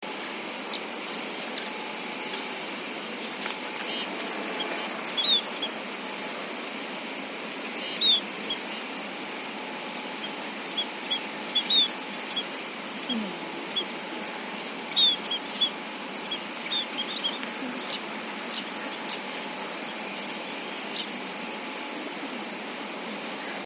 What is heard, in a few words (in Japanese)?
koshikin